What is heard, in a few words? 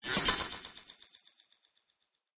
Electric Fantasy Sparkle, magic; Magic Sound Effects